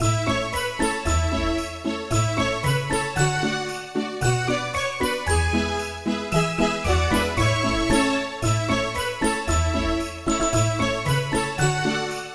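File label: background music lobby christmas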